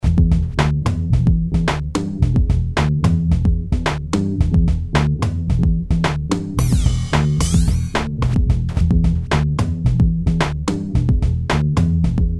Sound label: funky bass